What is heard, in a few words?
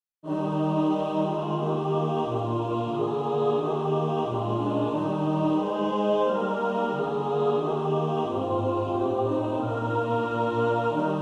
mixed; Praise the Lord ye heavens adore him